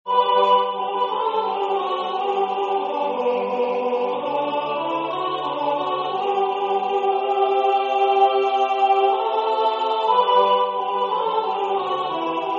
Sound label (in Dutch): alt 1; Dans ropte Felen